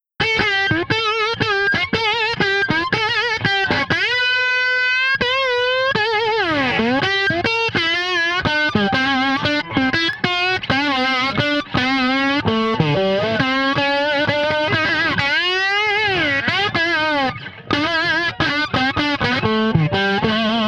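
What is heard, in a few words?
Blues Guitar Solo; B Sect Solo; Digidesign; Pro Tools Essential Loops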